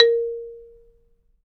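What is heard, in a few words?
MARIMBA C6; ILIO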